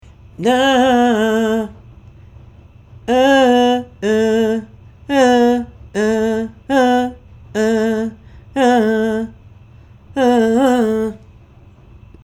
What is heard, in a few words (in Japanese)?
フェイク（地声）; 抑揚について; vocal